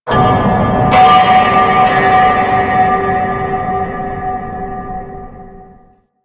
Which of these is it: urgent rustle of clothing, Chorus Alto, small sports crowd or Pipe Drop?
Pipe Drop